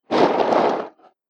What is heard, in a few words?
Horror Movement Crumbling Crypt Sounds; Scary Soundscape; Andrew Fleming